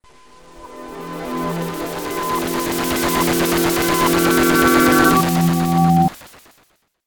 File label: distort; Histacom2